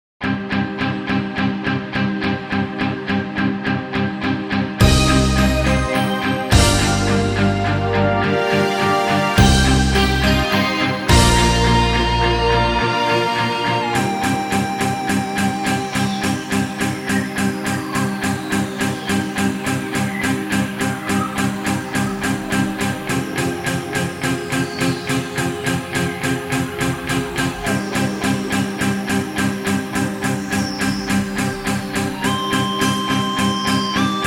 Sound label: brass minus